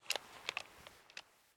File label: Take Flash Light; Game rip; MiSide